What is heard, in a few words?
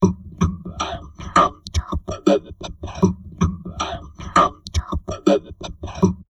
TALK, Drum Loops Crazy Processed; USB Soundscan vol.07; AKAI